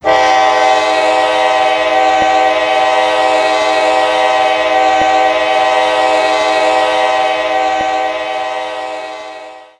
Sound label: horn; k5h csx; run 8 misc horn pack